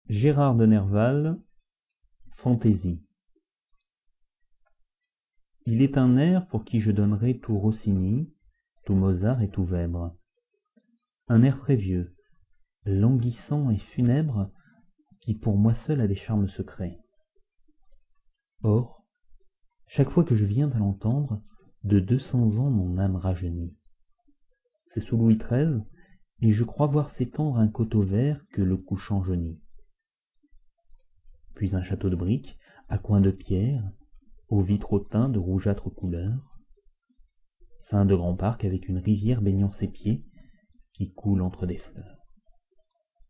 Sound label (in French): fantaisie